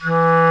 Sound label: Clarinet & Bass Clarinet; OMI - Universe of Sounds; EII Factory Library